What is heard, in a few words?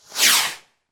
launch